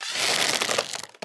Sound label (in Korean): 차징 활 당기기